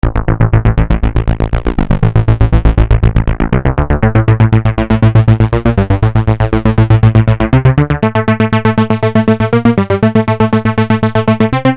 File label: synth